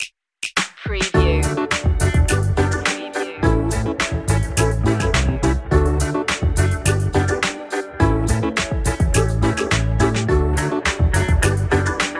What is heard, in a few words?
walking on air, A cheerful and sweet track, with groovy; pop; funk